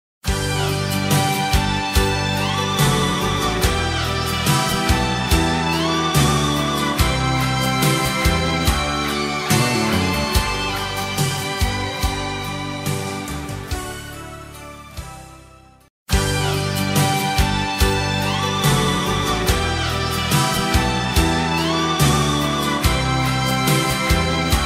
solo de guitarra classica anos 80

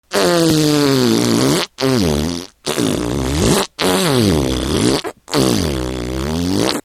som de peido

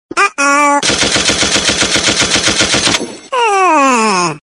toque tiros

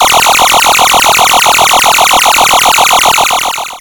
tiros de arma laser

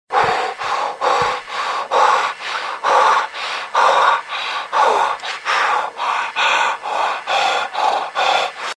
respiracao; efeito sonoro

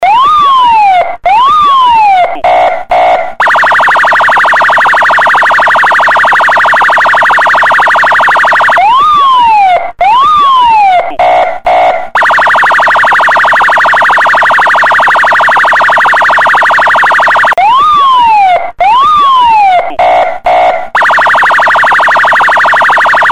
toque de sirene policial; toques para celular

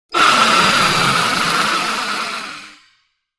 ruido pterodactilo; efeito sonoro

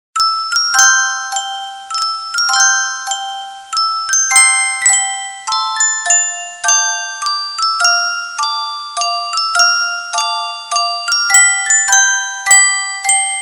barulho de caixinha musical

